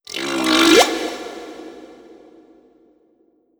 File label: Critter Female Verb; Kahlo